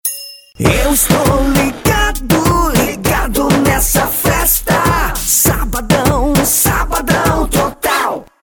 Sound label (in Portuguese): vinheta